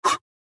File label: Punch